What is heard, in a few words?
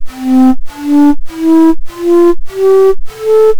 Flute Slide